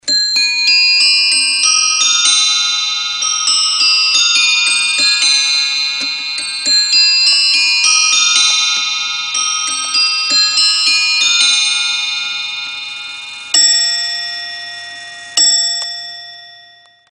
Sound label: whittington nested bells